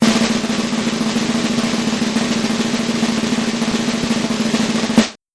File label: giveaway suspense